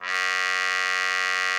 CUP MUTE F#2; Xsample VOL-3

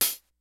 HATS CYMBALS; DRUMS; Kid Nepro; Elite

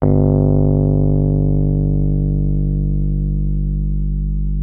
Rhodes G1; Xsample VOL-1; 90 s Sample CDs

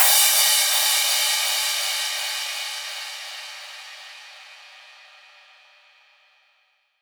Crash, Cymbals

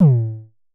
Festival Kicks - A2, Kicks, Vermona Kick Lancet; LookIMadeAThing Kicks

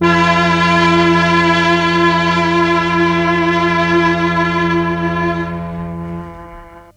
MEL STR, Legendary M400

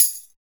HARD TAMB, TAMBOURIN

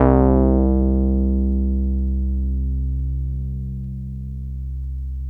ODY bassics C2, vintage keyboards; Big Fish Audio - First Call Vintage Keyboards

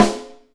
Snare, Alesis DM5

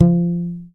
YAMAHA5 MUTE F3, Bass

